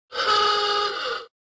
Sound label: model a car horn; Cartoon FX